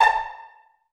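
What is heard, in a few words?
pizzicato A3 MF, STRING PIZZ; Sound On Sound - Peter Siedleczek's Orchestra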